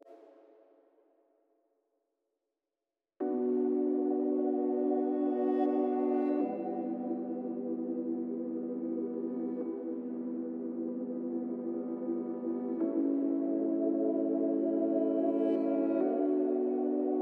Pad, 75 BPM A# Maj; Melody; Melodies; Loop Stems; Cymatics; 2022 Melody Mystery Expansion; Mescaline

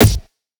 Perc, Dubstep; Percussion; Drums; One Shots; Cymatics; Dubstep Starter Pack

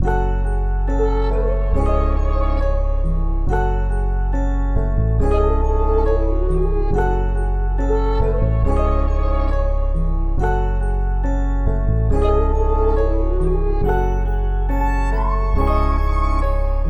139 BPM C# Min; Cymatics; Benz

